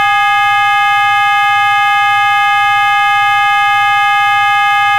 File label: wave phase ramp per cycle, 400Hz＋8倍音の2～8倍音の位相を順次周期ごとにずらした音（音色が変わった！）